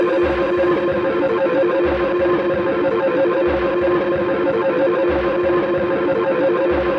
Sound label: Crude Tape Loop, Tape delay; radiosonics